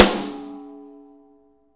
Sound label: SN AMBIENT, Real Mega Drums